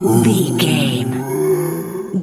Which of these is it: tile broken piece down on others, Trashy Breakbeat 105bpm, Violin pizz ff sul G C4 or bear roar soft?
bear roar soft